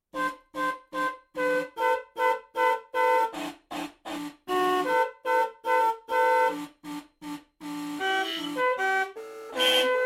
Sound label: sleighbells